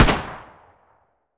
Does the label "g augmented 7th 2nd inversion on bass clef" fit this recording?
No